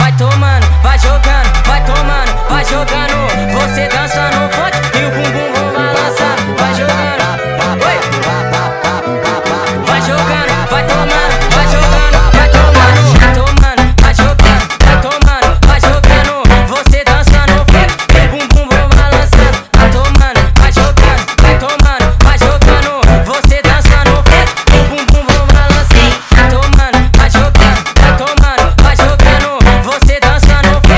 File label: FUNK (SPED UP)